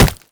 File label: body impact; headcrab classic, movement; creatures